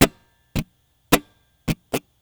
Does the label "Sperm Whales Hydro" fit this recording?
No